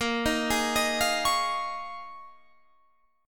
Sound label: Eb 9th Suspended 4th